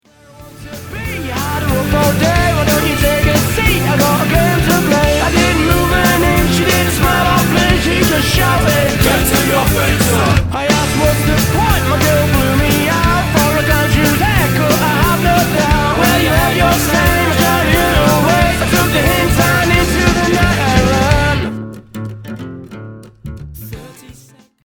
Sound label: rock hammond organ